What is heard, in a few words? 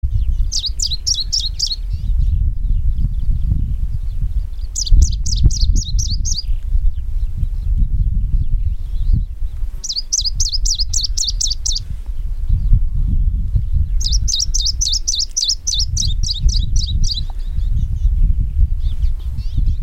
warbler smoky Phylloscopus fuligiventer